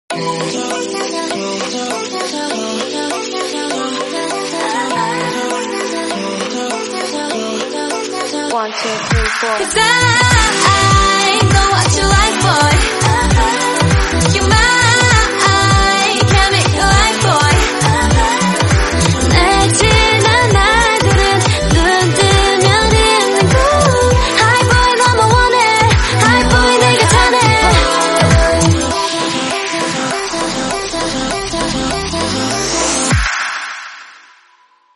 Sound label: korean song